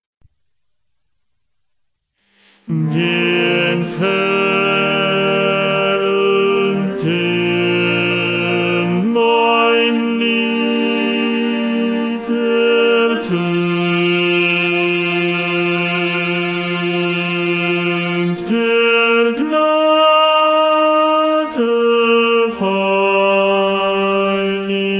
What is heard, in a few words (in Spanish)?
Bajo I VOZ Coro Peregrinos